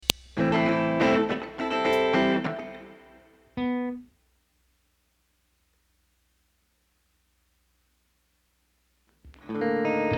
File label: Ambient Guitar Mix